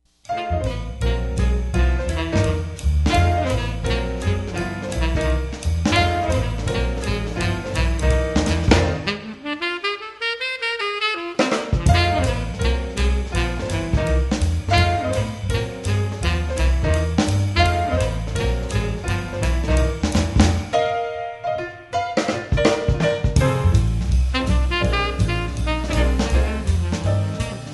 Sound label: quartet